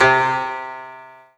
Kawai K11 Koto C3

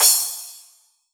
Yamaha RM50 Splash Cymbal

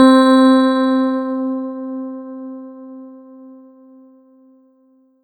Ensoniq ZR 76 Lectric Pno C4

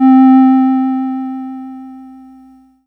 Yamaha PS-3 Flute C4

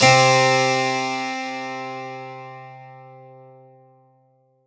Ensoniq ZR-76 Harpsichord C3

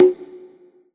Roland SC-88 Open High Conga

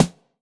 Yamaha FS1r Drum Kit 9o9 Snare

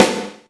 Korg NS5R Power Rimshot

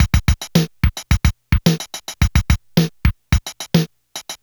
Casio MT-600 Disco 2

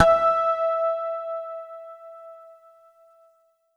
Yamaha TG500 GT Harm E5

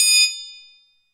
Triangle Open

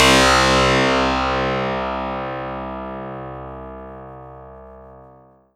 Yamaha TX81Z Fuzz Clavi C2

